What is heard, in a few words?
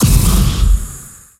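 Flame Thrower Short Burst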